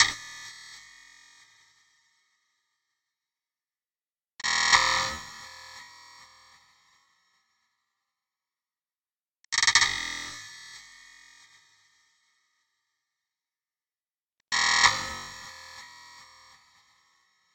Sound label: Alien Clock Ambient Space Sound